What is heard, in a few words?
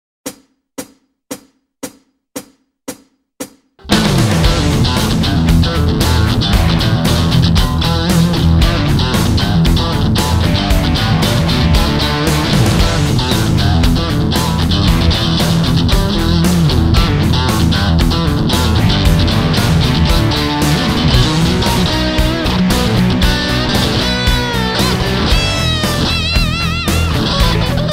Rock Jam